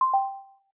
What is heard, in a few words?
chat; sketcher; jotuntech